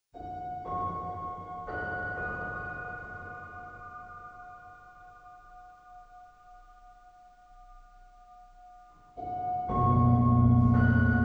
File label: Ambient QRS wet